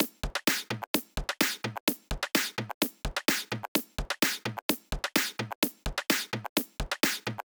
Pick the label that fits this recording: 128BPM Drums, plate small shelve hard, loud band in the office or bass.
128BPM Drums